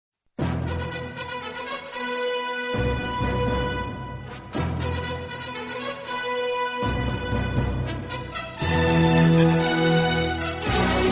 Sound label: famous bgm, ringtone